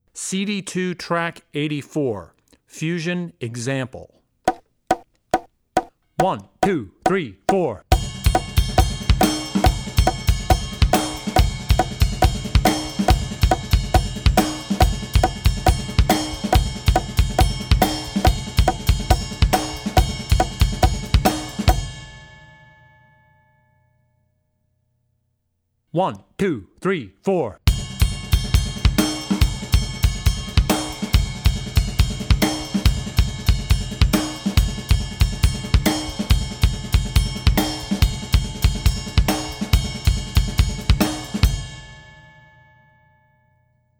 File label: Rock N' Roll - Fusion; CD2 Track84 Rock Fusion; Drummer's Bible Tracks